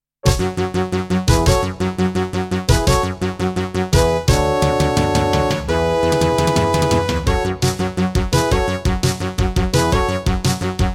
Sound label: Intro, Yamaha CBX-T3 MT32 Mode; 4D Sports Boxing